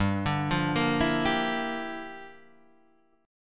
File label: G Minor 13th, chord; Gm13 arpeggiated; JGuitar